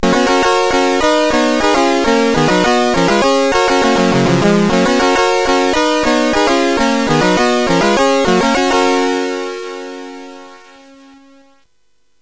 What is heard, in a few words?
Super Cup Football, CSG8580R5; hvsc